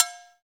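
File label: percussion cowbell; Roland L-CD701; PRC Latin 1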